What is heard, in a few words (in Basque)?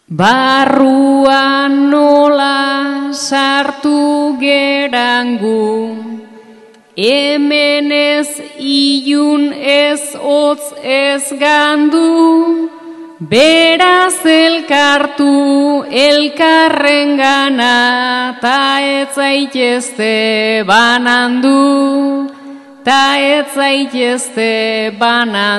agurra, bertsoa; zizurkil